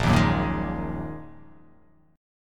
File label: Bb 7th Suspended 4th Sharp 5th Bb; Bb7sus4#5; strummed; 6,6,4,3,3,3,x,x; JGuitar